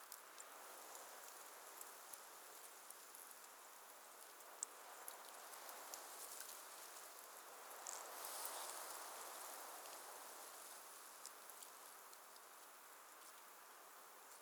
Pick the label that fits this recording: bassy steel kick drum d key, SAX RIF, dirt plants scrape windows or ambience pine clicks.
ambience pine clicks